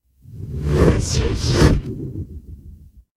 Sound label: transition whoosh